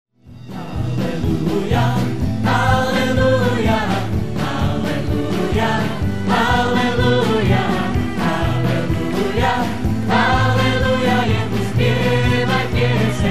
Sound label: Halleluja